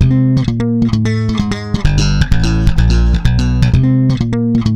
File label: FANCY FILL C, 130 BPM, Bass; Sampleheads; Ultra Freakin' Bass